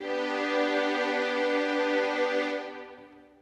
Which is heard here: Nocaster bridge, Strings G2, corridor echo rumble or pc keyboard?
Strings G2